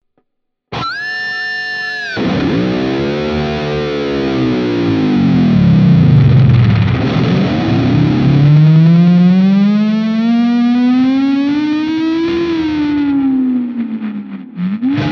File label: AZ2407F lead Tremolo